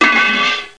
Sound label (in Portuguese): batida